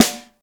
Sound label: clean snare one shot c sharp key